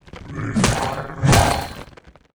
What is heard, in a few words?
BLAZE BLOCK WAR ATTACK